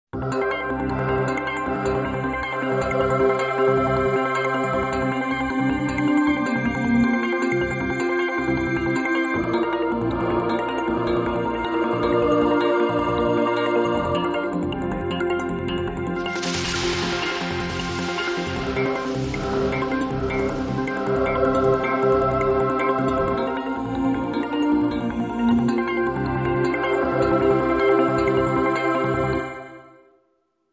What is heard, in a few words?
Pulsing Heavenly Voices